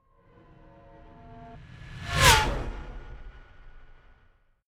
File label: fly by hover car hum high